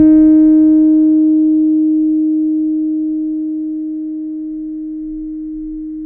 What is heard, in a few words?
D#4 PP, Piano cp80